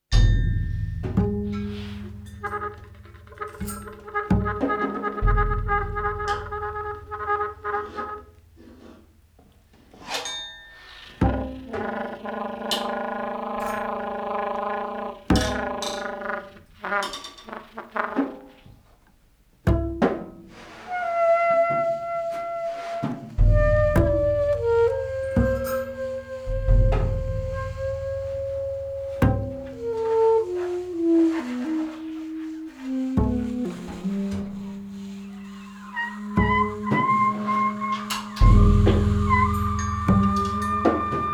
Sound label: Quartet